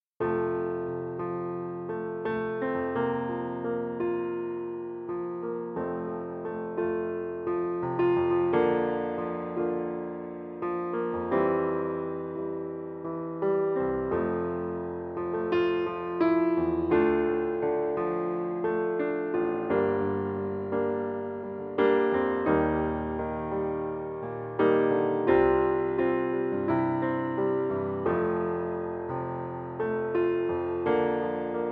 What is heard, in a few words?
FOLK